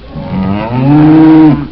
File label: Cow, Beeps, Zoology; m68k-amiga-OS